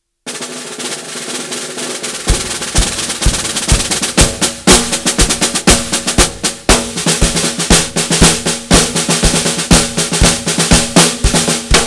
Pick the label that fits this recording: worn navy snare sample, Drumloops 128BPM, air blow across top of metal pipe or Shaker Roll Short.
worn navy snare sample